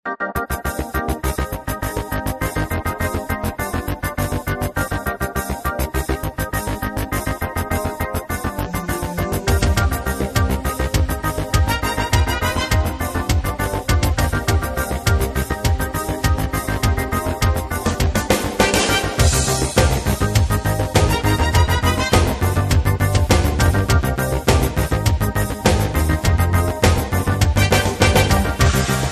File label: minus lofi